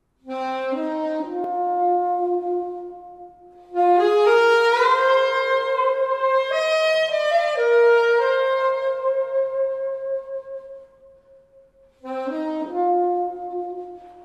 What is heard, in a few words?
Improvisation